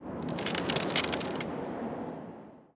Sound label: ice Shoot; netent; magicportals